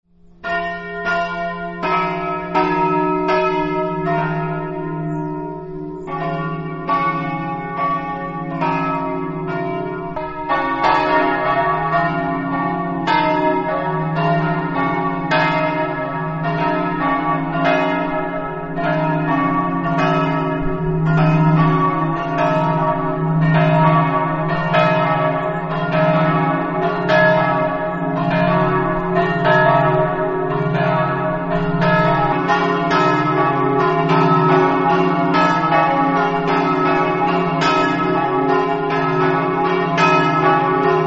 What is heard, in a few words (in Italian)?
flaibano, campane